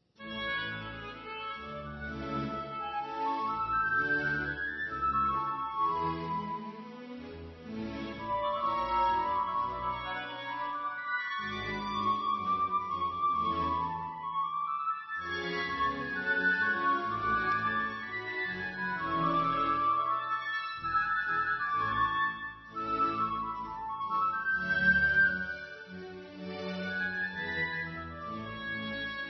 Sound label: Aria in A duet; Baroque